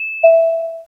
a cheerful satisfying ch; bright

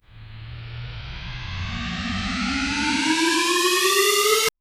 buildup riser sound, anticipation

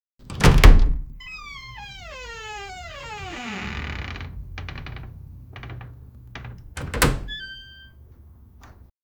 door, sound-effects

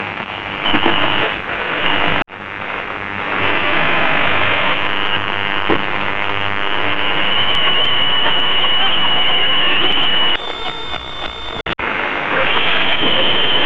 retro radio tuning effect; 80s pop

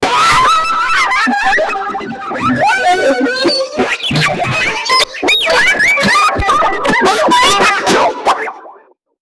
bonk boing boing boing; whimsical

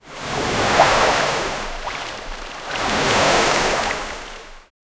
a big fish swimming in, marine life

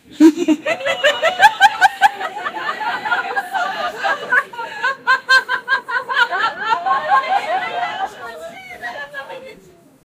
people are at a dinner

